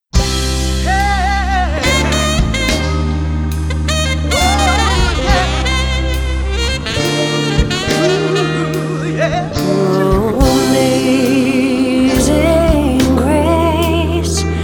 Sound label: Amazing Grace Vocal